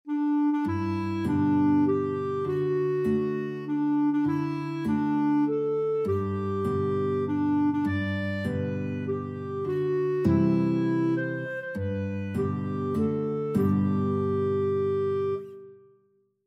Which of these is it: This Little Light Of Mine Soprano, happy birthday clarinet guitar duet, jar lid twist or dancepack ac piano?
happy birthday clarinet guitar duet